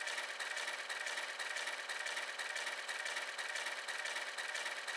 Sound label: autoplay Spin; piggy riches